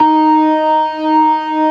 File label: ORGAN, NO PERC